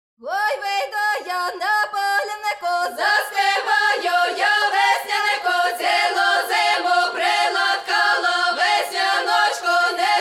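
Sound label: vesnianka oj wyjdu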